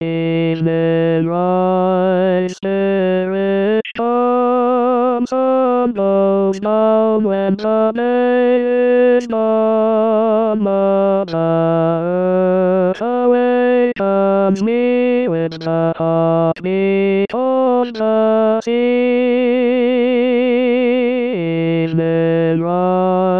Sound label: Tenor